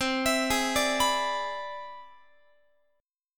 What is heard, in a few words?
C Augmented Major 9th, Guitar; arpeggiated; JGuitar